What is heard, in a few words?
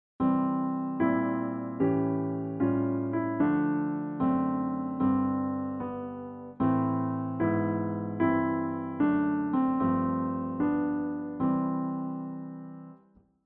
Boesendorfer Classical Recording STEREO